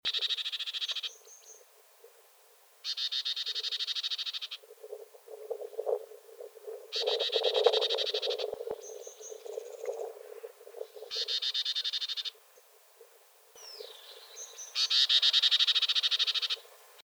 suara call marshall iora

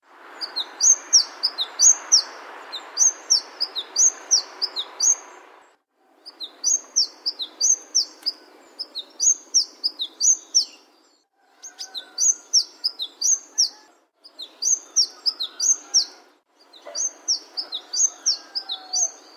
burung madu matari

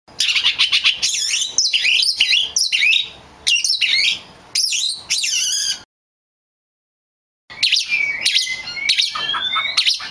audio burung kacer lutung cebu

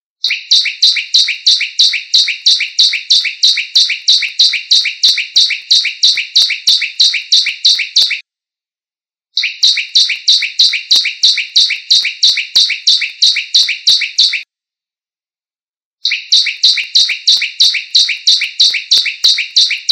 suara burung ciblek lain

